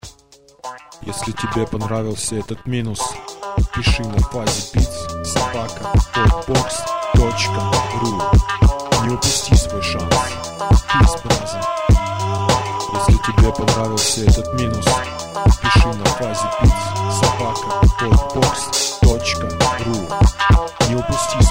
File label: funky